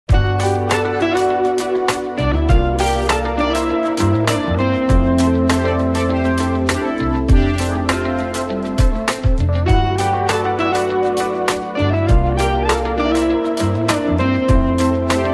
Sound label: Instrumental; Stonekeepers